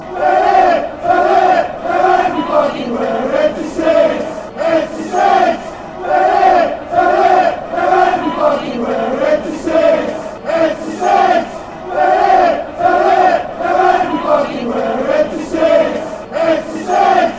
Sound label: empty seats, fanchants